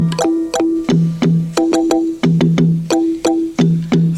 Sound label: Silent Msg Tone